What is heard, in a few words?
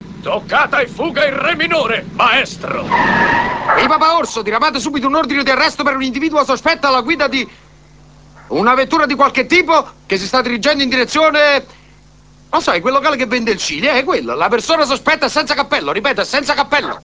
winchester; winchester2